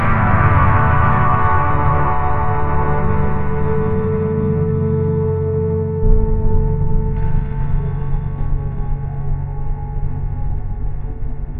ambient soundscape; ai

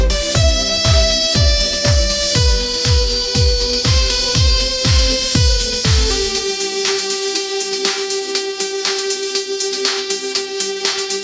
upbeat pop melody, ringtones; ai-generated

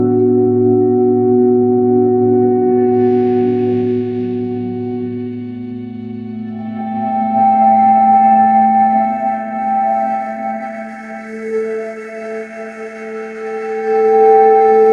crystal dreams soundscape, ringtones

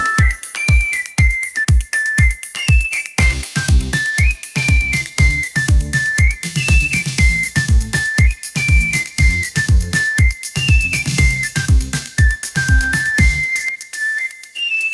upbeat pop melody, ringtones; ai-generated

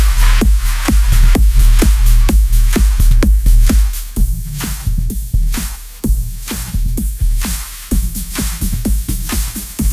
high energy edm festival drop, ringtones

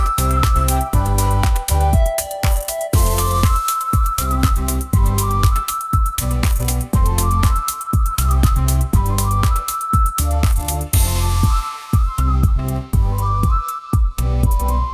upbeat pop melody, ringtones

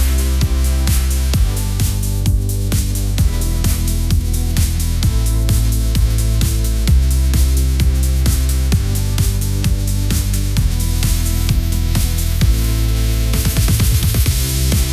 k pop style; ai

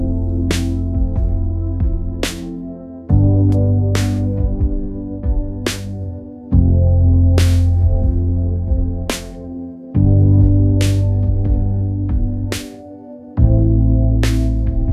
lo fi hip hop, ringtones; ai-generated

